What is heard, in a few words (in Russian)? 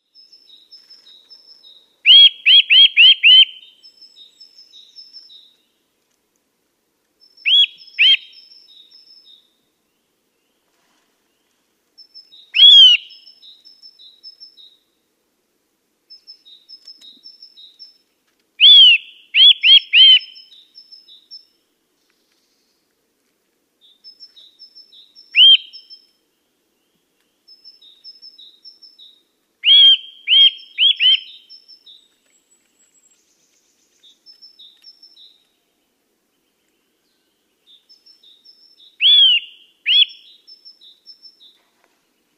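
Obyknovennyĭ popolzen, nuthatch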